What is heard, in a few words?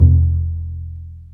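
String, contrabass pizzicato; Roland - String Master Series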